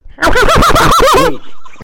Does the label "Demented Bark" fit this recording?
Yes